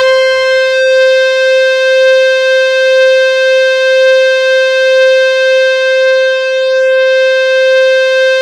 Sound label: C5, Synth Line; 90 s Sample CDs; NiceBeats; SynthLine VOL-33